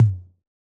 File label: Tom, Yamaha DJX 2B